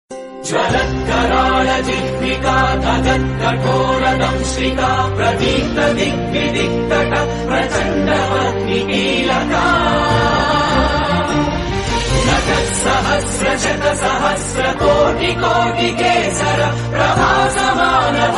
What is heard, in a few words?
lakshmi narasimha swamy mantra